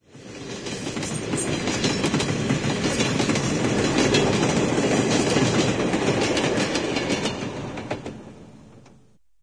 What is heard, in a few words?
train pass, ambient machines; Source Engine; cstrike